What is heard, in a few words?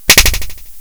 clap